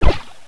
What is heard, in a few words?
gun; svencoop; cryocell